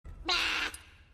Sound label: BLAAHH